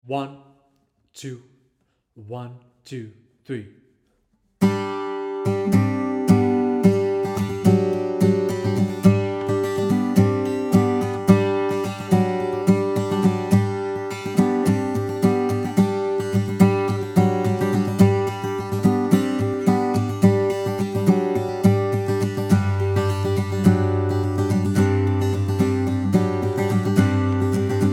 Old Morpeth Rant, Guitar Full Speed, Play along backing tracks